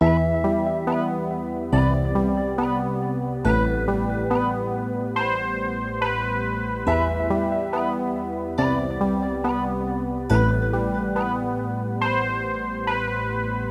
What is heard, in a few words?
Sad Melody Loop - 140 BPM E Min; Cymatics; Oracle Sample Pack